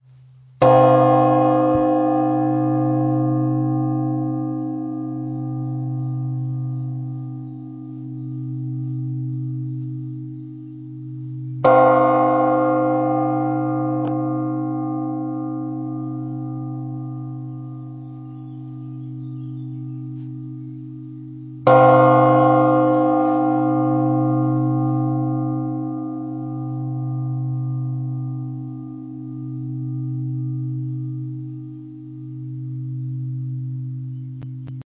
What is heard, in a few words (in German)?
Tonaufnahme c1 2536kg